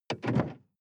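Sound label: sports car interior trunk close soft; verkehr transport; autos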